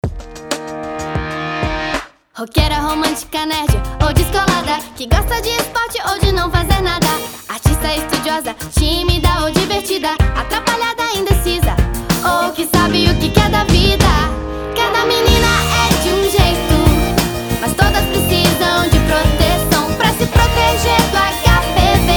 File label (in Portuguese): jingle hpv; noticia